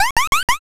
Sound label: monkey